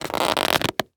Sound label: Latex Gloves Box; Blastwave